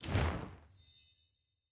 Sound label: collection unlock celebration; tftset16